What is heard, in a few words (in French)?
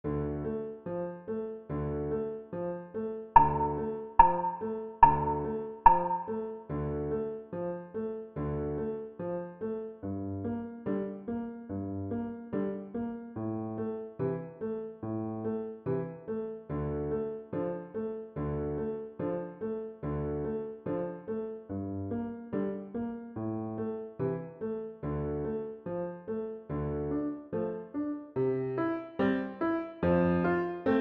alto